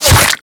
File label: Bullet Flesh; Warframe